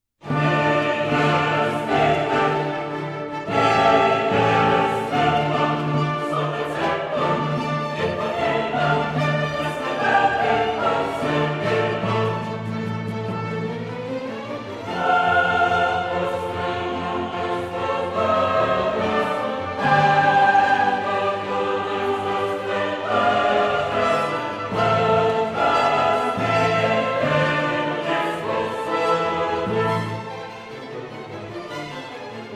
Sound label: sequenz, requiem; ANDĚLOVÉ JSOU ZPÍVALI